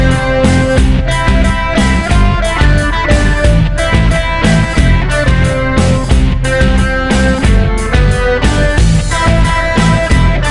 Summer Instrumental

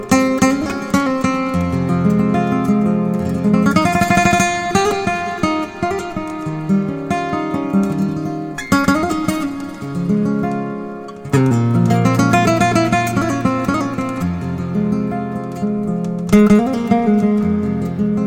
Oldies

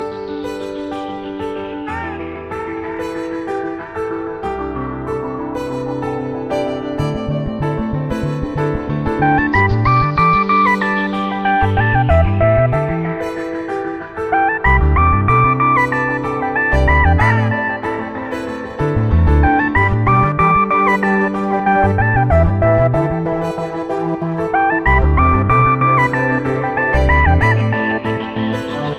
melody ringtone

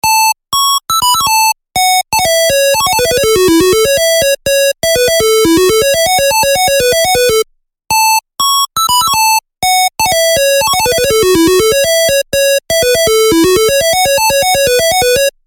Catchy Melody

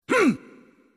Hit; Melee Falco

